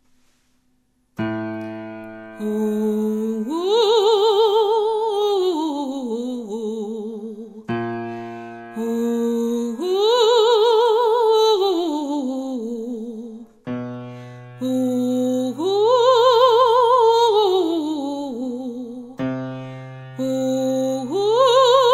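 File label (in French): saut octave alto baryton; Exercices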